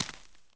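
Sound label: walk left medium, dirt foot; The Elder Scrolls III Morrowind GOTY; XBOX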